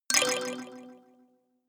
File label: notification kalimba delay slide minor